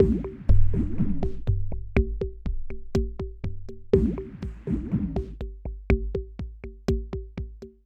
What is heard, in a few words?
Synth